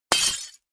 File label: Small window breaking with debris